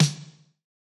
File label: snare; pasific; Pop